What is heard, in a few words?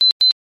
Alarm; Pantech Breakout